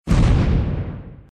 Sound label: explosion; car vs cops